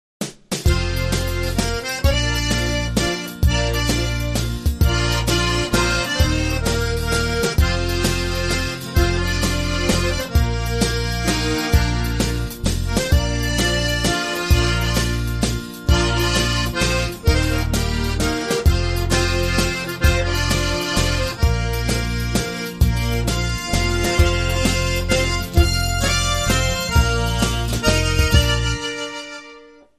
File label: Zydeco Waltz